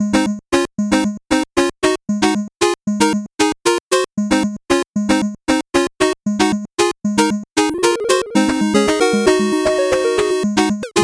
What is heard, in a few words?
8bit bgm fast